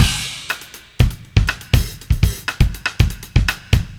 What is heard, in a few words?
live beat; Sonic Foundry (Sony Creative Software); Ugly Remnants VOL-2